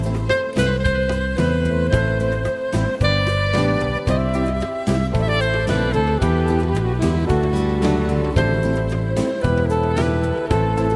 happy sax

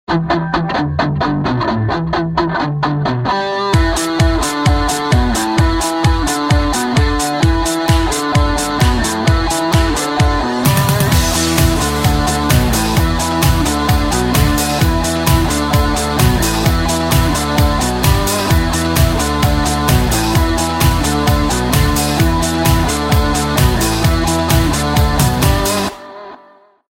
xperia ringtone

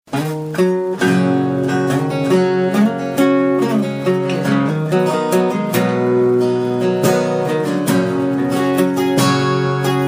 original music

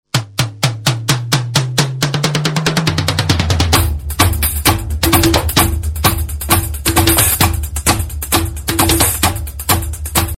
mega drums

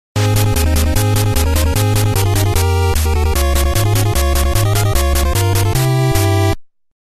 flamenco; punch out; don flamenco

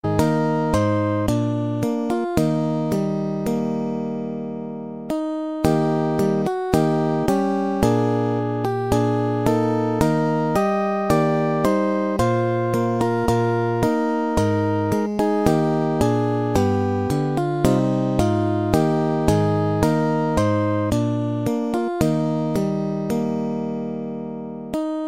christmas bells

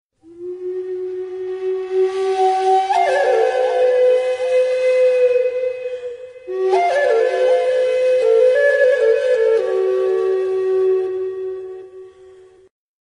flute

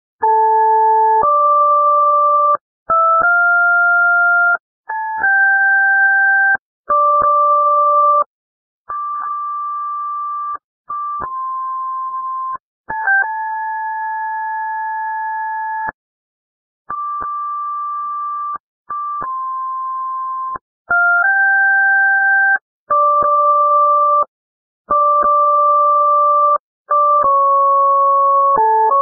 ringtone

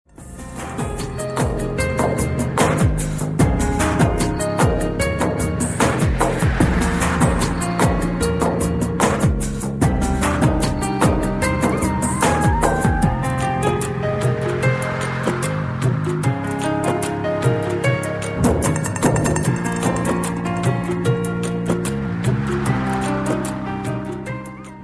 lounge mix

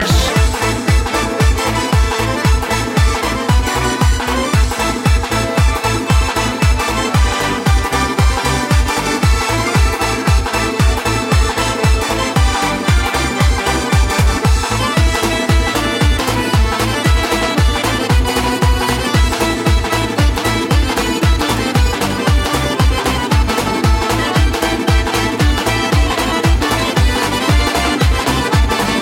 lezginka mix

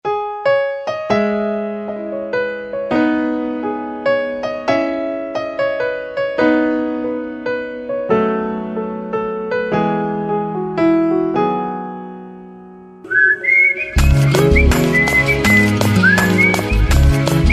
whistle; udhayam nh4

